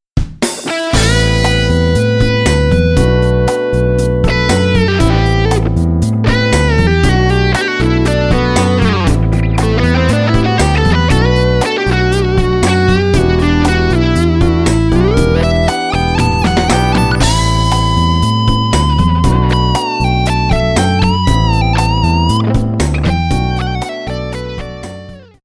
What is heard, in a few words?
patch demo